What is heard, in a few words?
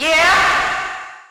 Vocal Verb "Yeah"; One Shots; Ableton Live 12 Lite; Core Library